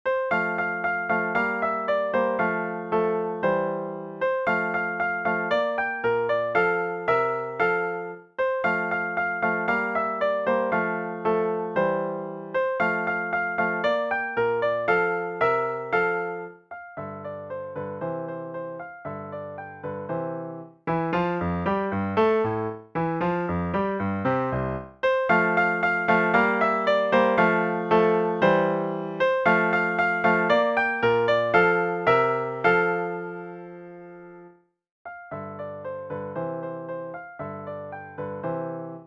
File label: Anglaise; Roessler